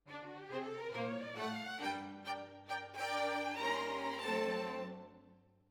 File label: Violins Over